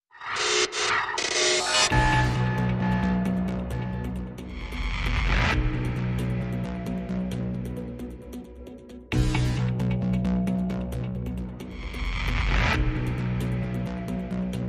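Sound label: Percussion and Pulses Only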